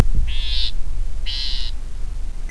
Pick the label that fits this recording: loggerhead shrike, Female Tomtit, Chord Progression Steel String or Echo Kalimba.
loggerhead shrike